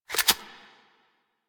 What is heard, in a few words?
Pistol Reload Mid; Weapons; Warframe; Tenno; Priest Pistol